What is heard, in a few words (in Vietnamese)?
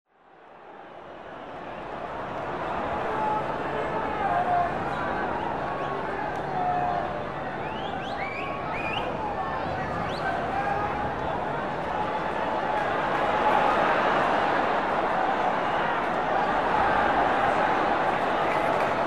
Tieng on san van dong da bong